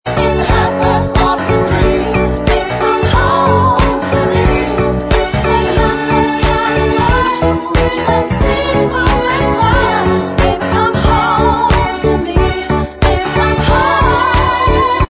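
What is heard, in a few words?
backing vocals Track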